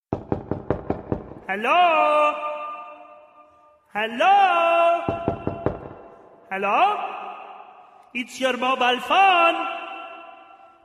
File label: Mobile Knock Answer